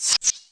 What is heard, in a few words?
spooky